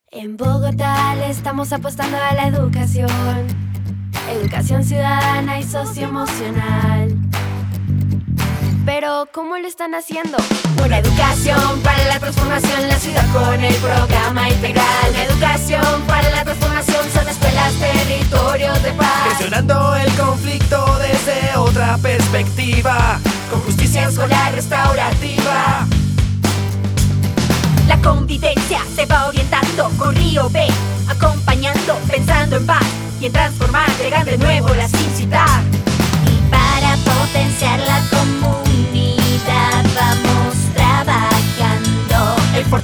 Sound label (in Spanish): Jingle; Programa Integral